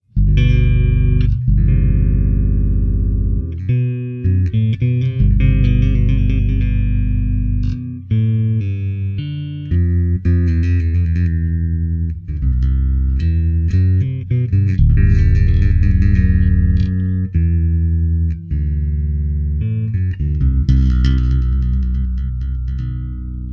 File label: sarabande, Electric Bass